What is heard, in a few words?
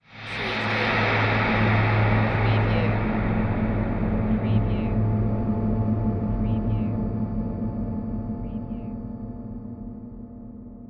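Production Element Airy Reverb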